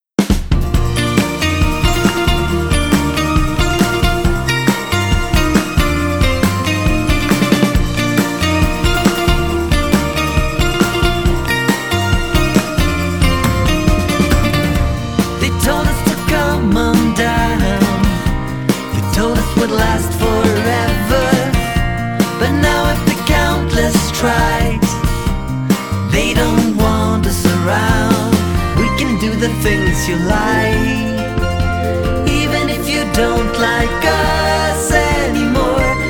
alt rock

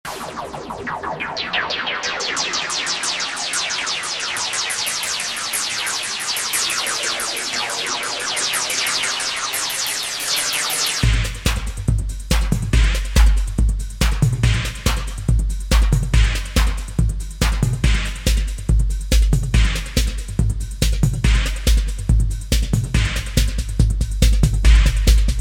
Electronica

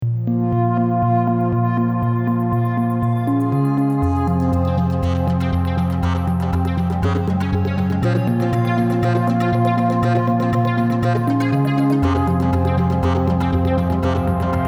Hyper Synths

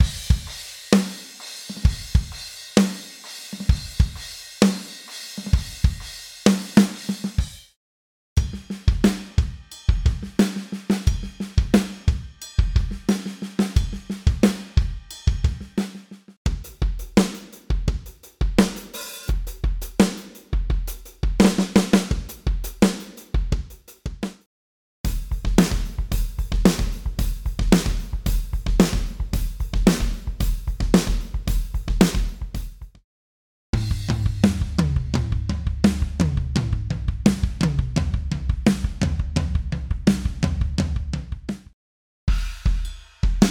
heavy kit; presets united

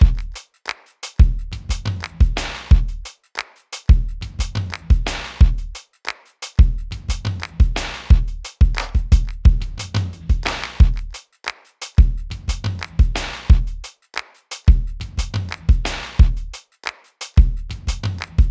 Jazz

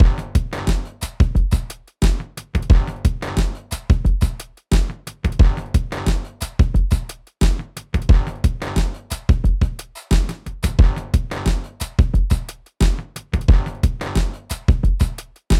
Rock Disco

